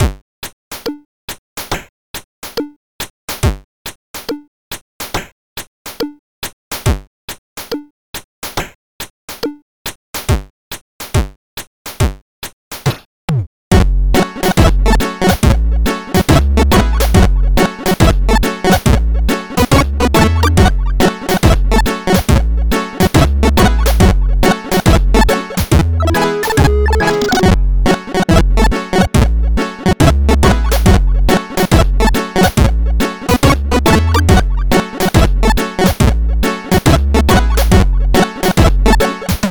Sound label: Commodore 64 Synthesizer; BEDROOM PRODUCERS; Commodore 64 Synthesizer Sessions DELUXE